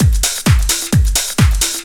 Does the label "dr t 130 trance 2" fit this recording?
Yes